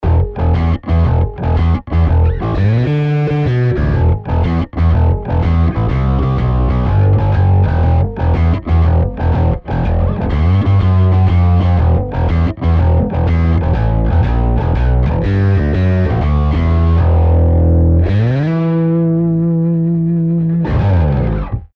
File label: BOSS GT1000 XDRIVE BASS CMP